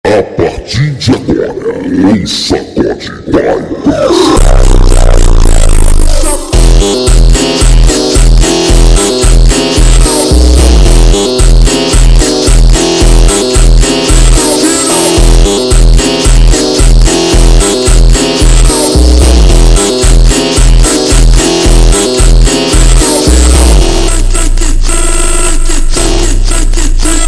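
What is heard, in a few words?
Montagem super Slowed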